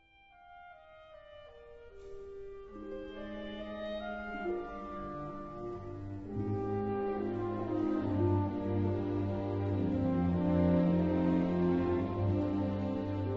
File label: orchestral game concert